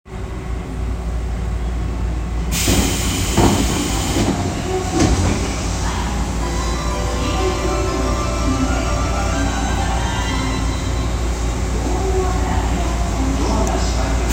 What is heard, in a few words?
train sounds; 有楽町線千川西